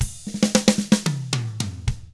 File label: POP ROCK Drums; 109POP ROCK